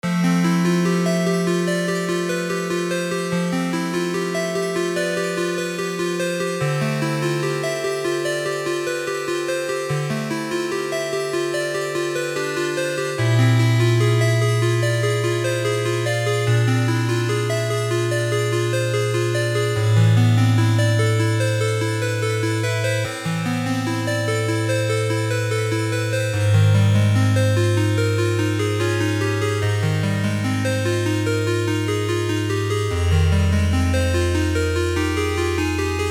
BGM Interlude Entry

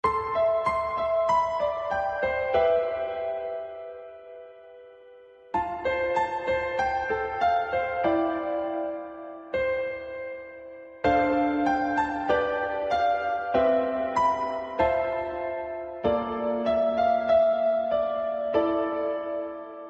bgm; LiveOrDie

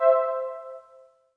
load

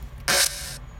start

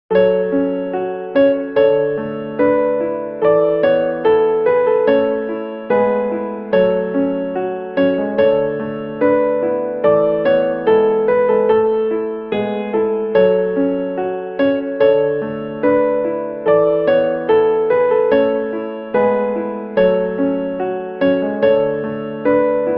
home leaving

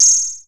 rewind exempt stop

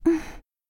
Breathing

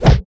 Attack

